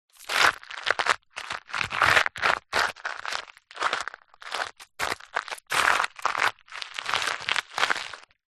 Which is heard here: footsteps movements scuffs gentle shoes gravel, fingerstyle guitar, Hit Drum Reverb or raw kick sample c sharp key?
footsteps movements scuffs gentle shoes gravel